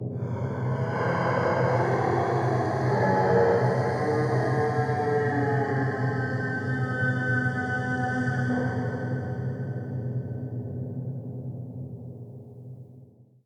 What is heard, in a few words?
wind creature; anemoi